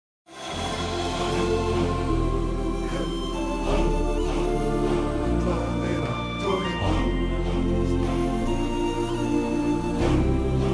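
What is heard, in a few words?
karaoke instrumental; lion king; disney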